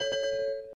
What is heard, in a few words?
Steam warn